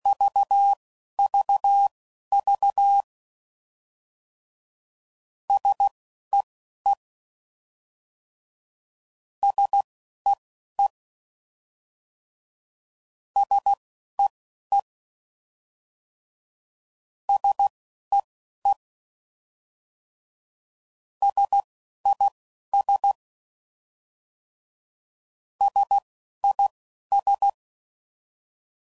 CW Code Groups 10WPM, Contrasts; Morse Code

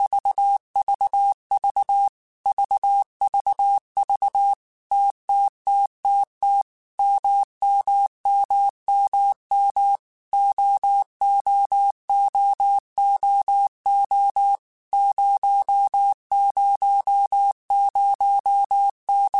TMO0, Morse Code; CW Code Groups; Basic Letter Groups Collective7; HamRadio; Basic Sets

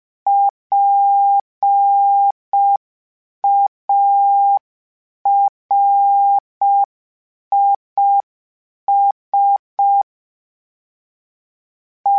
PARIS, Morse Code; HamRadio; PARIS Calibration

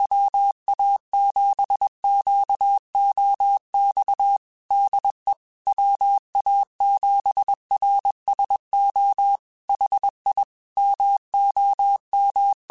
Morse Code, QSOs

